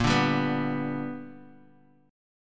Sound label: B-Minor Major 7th Sharp 5th A sharp